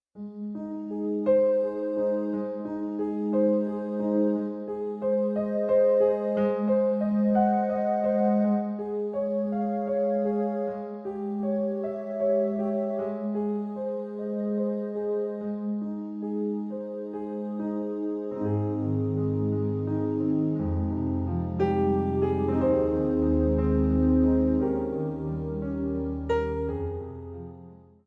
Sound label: Naked Piano Christmas Ave Maria